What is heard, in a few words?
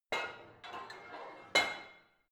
barbell heavy plate put on hard, fitnessstudio